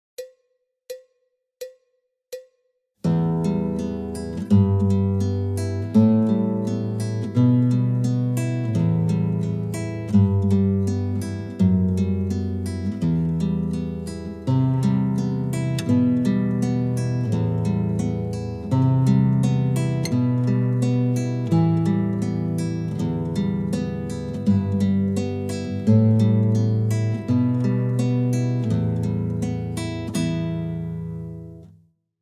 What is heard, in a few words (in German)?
Bassmelodie, Gitarre; Heute haun wir in die Saiten